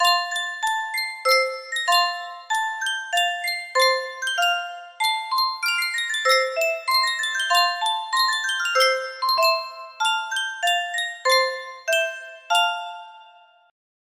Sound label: sankyo music box, melody